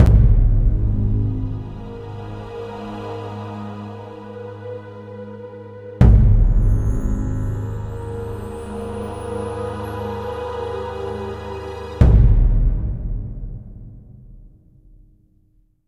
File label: Uneasy Sting; Jurassic Park The Game